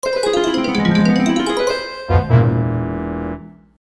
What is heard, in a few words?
Arcade Game Over; madagascar; ps2